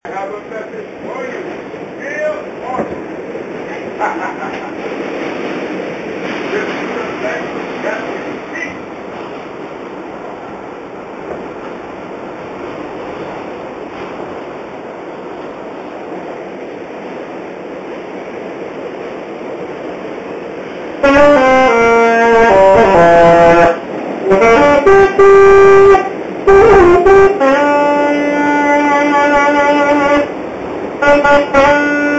guy on the 4 train playing sax; said he was an alien

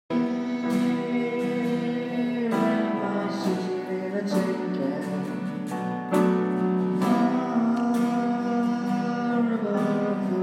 homeless choir sing; The Choir With No Name; Birmingham's homeless choir

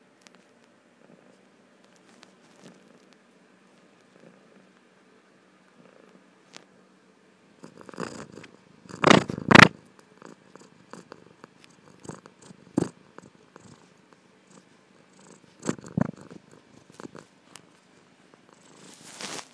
purring; kyku boo

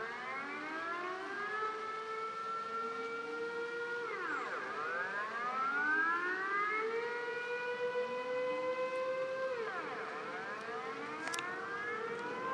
alarm

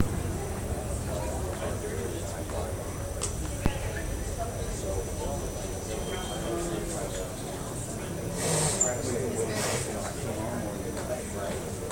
bcn airport gate e77

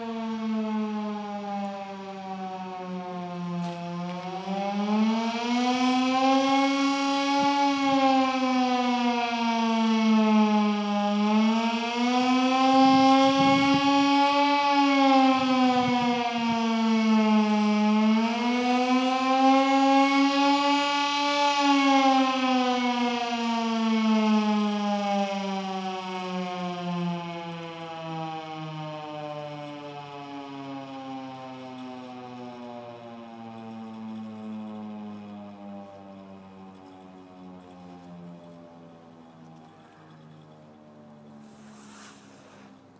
air raid siren in cathedral ruins; coventry

